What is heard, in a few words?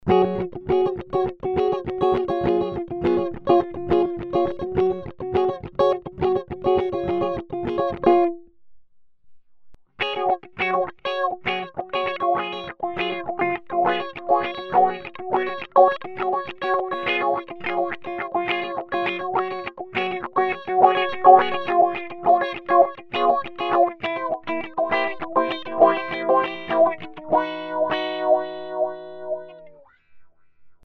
BOSS Dynamic Wah AW 3